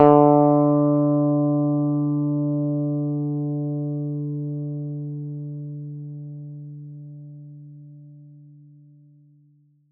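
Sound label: plucked electric guitar D3; Polyend; Simulacrum Sounds; 1 Shots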